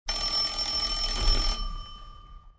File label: ring